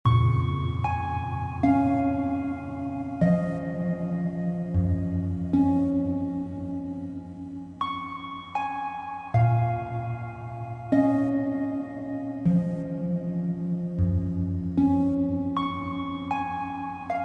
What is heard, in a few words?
Block Drop Music